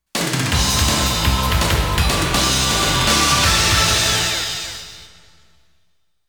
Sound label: Patten Clear; konami amusement sounds 93 autumn